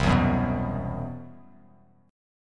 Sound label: C Major 7th Flat 5th C, Bass Standard; CM7b5 strummed; JGuitar